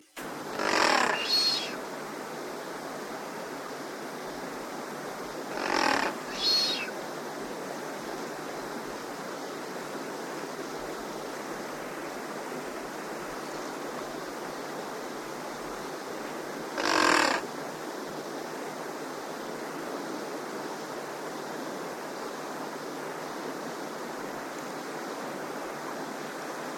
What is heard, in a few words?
Blue Duck